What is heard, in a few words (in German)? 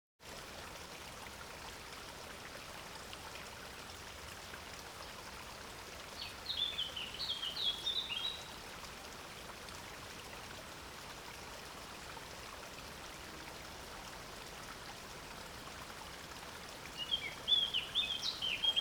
bachgeriesel mit vogelgesang; geraeusche digital